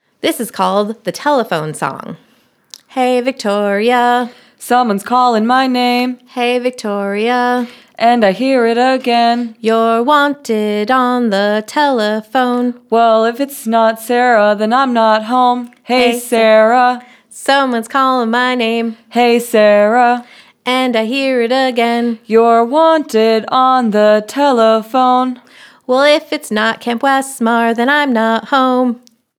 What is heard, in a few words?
Telephone Song